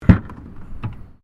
Car Toyota Corolla Hatch Open, hatchback; Vehicles

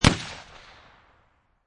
Rifle Marlin 30-30 Single Shot Exterior At 45'; Rifle: Marlin 30-30, Single Shot, Exterior at 45 feet

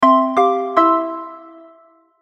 Musical Soft, Musical Soft Synth Chords; Musical Sound Design; Blastwave

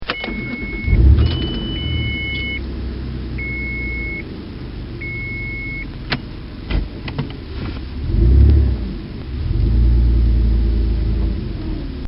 Dodge Stealth Starting Car Interior Sounds; Transportation

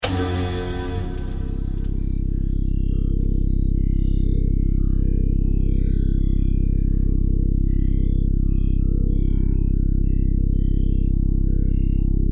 Science Fiction Medical Scanner Probe On Run Off; Tech; SFX Bible

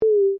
TONE A MOVE, User interface feedback sounds; Tones; Sound Design; Foundation4

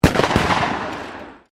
Shotgun 12 Gauge Double Barrel Firing 2 Shots; Sporting Shotguns

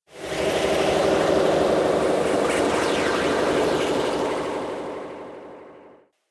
Scifi Afterburner, spacecraft; Spacecraft Sound Effects

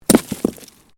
ROCKSLIDE; Material components for constructing more complex sounds

